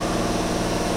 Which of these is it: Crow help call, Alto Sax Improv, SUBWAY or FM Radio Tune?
SUBWAY